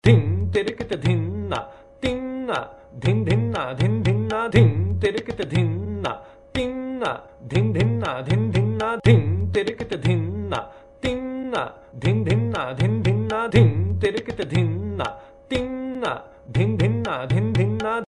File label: tala matta